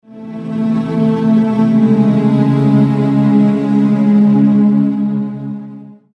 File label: lose game; svencoop; tu3sday